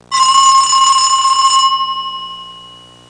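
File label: telephone; Sound Library 2000; Wayzata Technology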